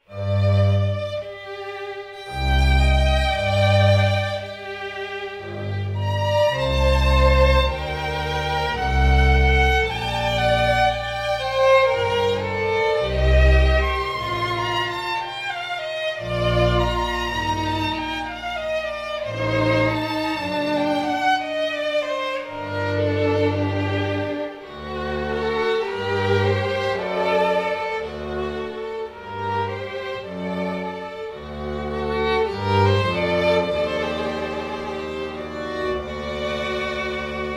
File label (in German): Sonata G-Moll; trauermusik; Op.7 Nr. 4; Willem de Fesch